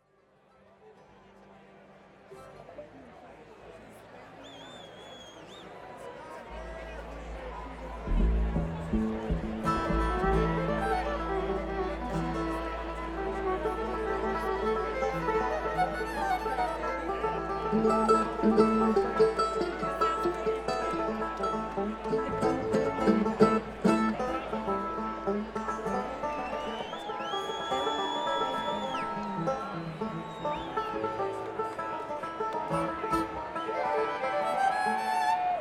tuning; Bluegrass; km184

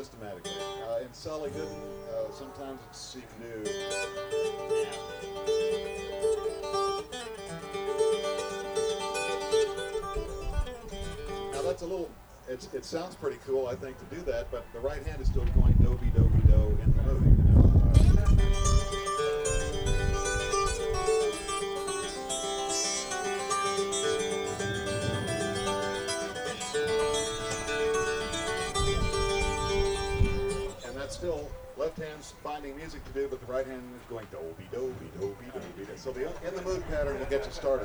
guitar; Bluegrass; Winterhawk Bluegrass Festival; Winterhawk 1993; Workshops

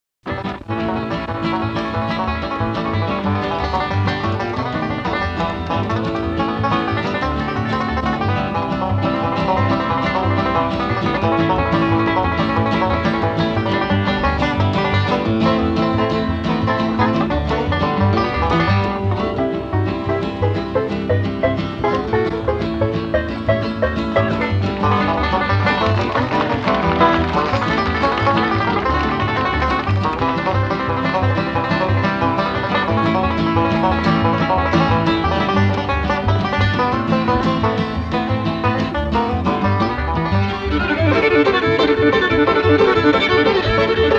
Instrumental; Bluegrass

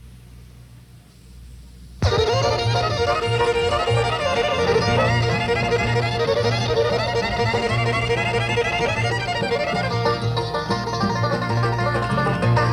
Instrumental; Bluegrass

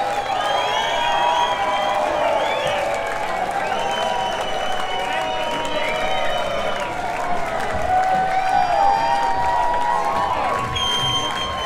crowd; Bluegrass

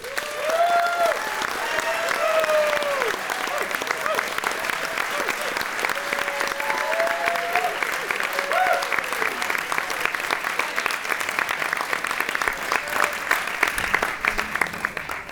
Guitar Workshop; Bluegrass; Merlefest 2012

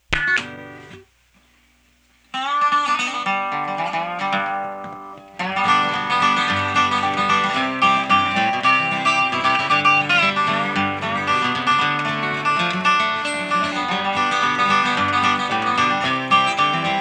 Instrumental; Bluegrass

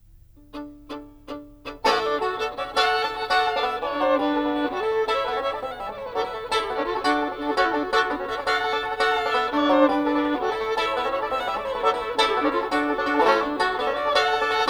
fiddle banjo tune; Bluegrass

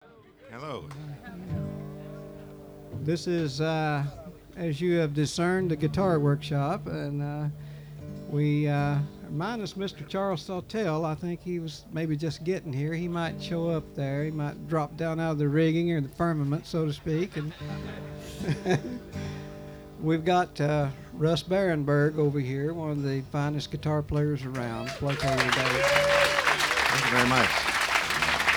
guitar; Bluegrass; Winterhawk Bluegrass Festival; Winterhawk 1993; WorkShops

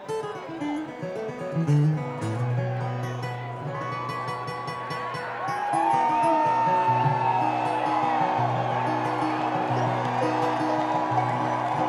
tuning; mg21

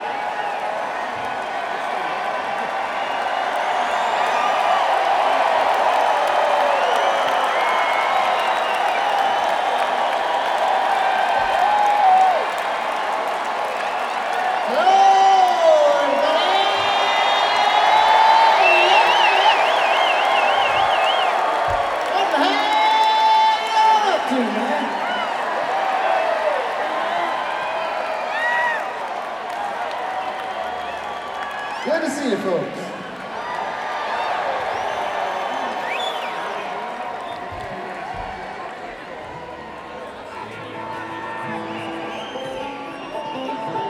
Tuning; Bluegrass

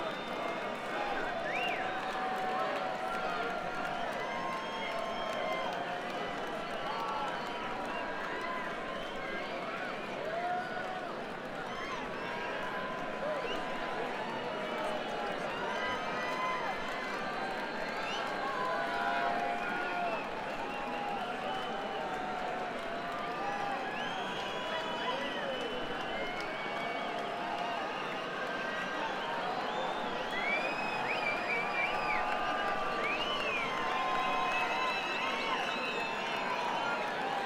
Crowd; Bluegrass

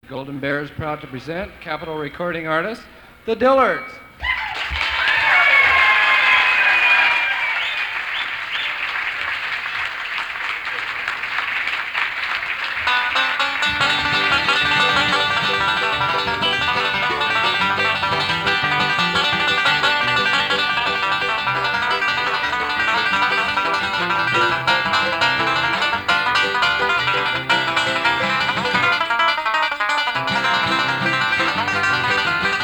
Set2 intro instrumental; Bluegrass

